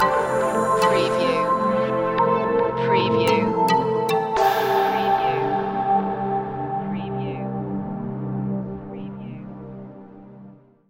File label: deep in chill stinger